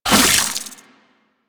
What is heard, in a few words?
Melee Whoosh; Enemies; Warframe; Infested; ZealoidPrelate